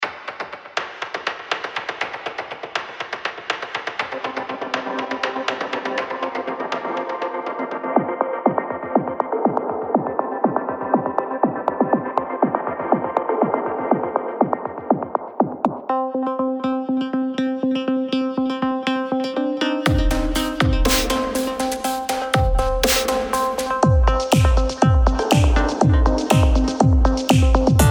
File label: rhythm